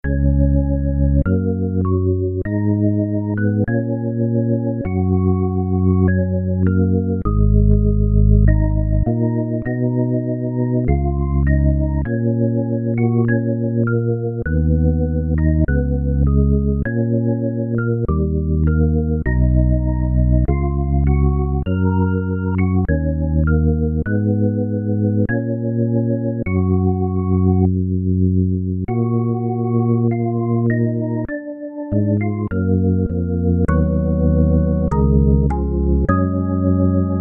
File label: anthem